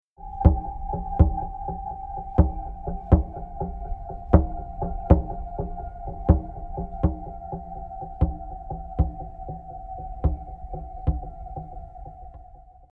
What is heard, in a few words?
harp dyn rythm